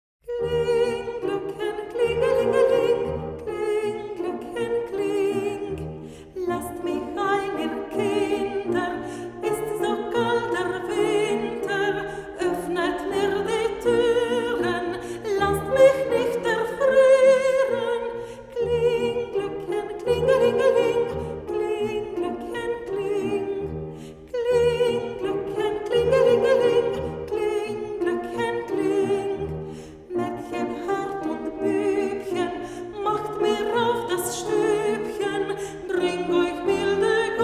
Volksweise Kling Gloeckchen kling, weihnachtsmusik; Klaus Brambach Stiftung zur Förderung junger rumänischer Musiktalente

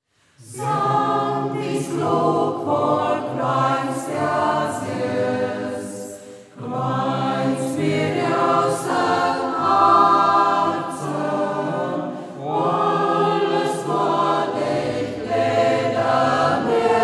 singkreis; Ortsmundart: Braller; Sangtijklok; Singkreis Kampestweinkel; Hegt wird gesangen

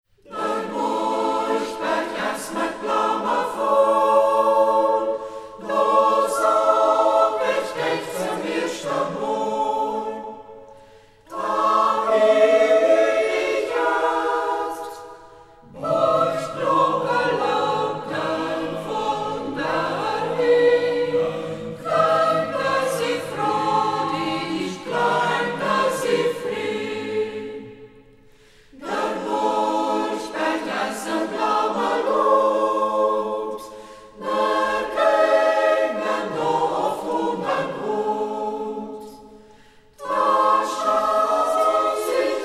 Reußmarkter Chor, Ortsmundart: Reußmarkt; Der Burjbärj; Fritz Schuller (Melodie); Hegt wird gesangen

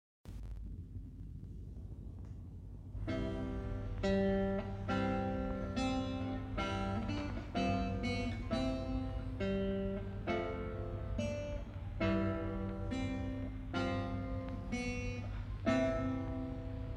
kammerchor; Ortsmundart: Schäßburg; Schäßburger Kammerchor; Hegt wird gesangen

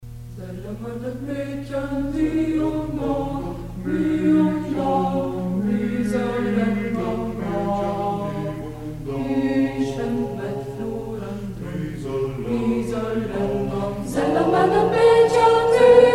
Madrigalchor; Hermannstadt; Sealle mir det Medchen; Hegt wird gesangen

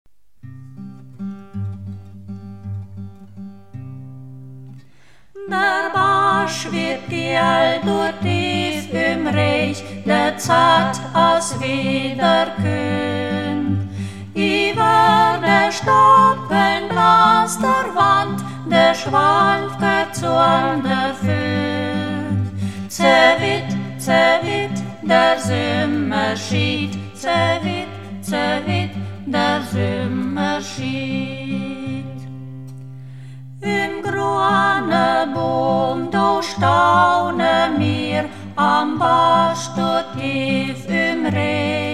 Ortsmundart; Ortsmundart: Niedereidisch; Anna Schuller-Schullerus (Melodie); Der Bäsch widd giël (De Schwalwker); Hegt wird gesangen

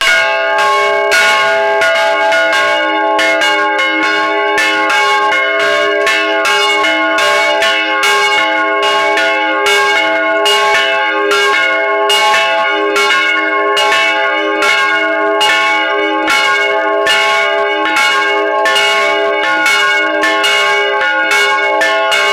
glockenlaeuten felmern

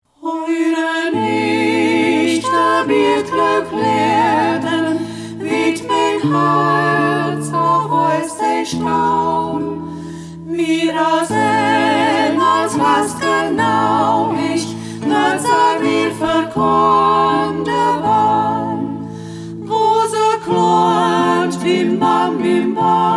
Zeidner Gesangstrio; Ortsmundart: Zeiden; Hiren ich de Biëtklok (De Biëtklok); Melodie: Rudolf Lassel; Hegt wird gesangen

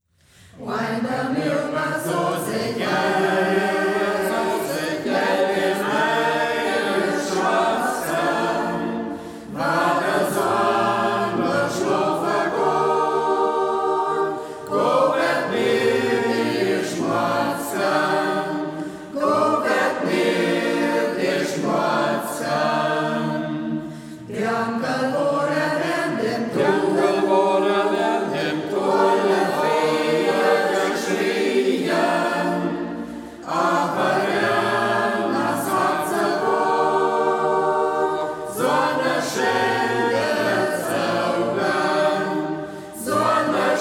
singkreis; Ortsmundart: Braller; Angderm Lirber såß ech iest; Singkreis Kampestweinkel; Hegt wird gesangen

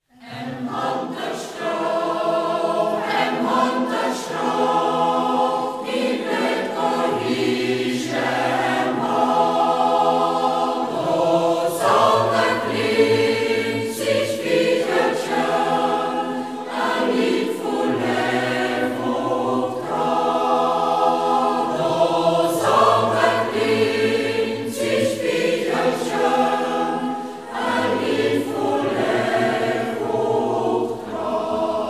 chor; Umgangssächsisch; Äm Honterstreoch; Honterus-Chor Drabenderhöhe; Hegt wird gesangen

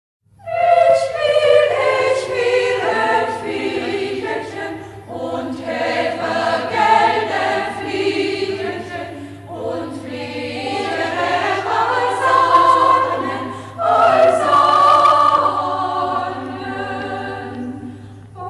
Kammerchor; Ich wil, ich wer e Vijjelchen (Drå Wänsch); Schäßburger Kammerchor; Kästner (Text), Rudolf Lassel (Melodie); Hegt wird gesangen

